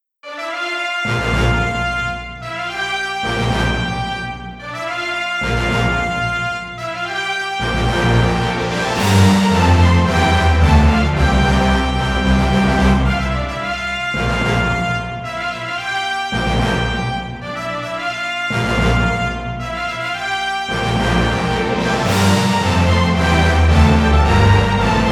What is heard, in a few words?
entrance fanfare